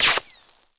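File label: ricochet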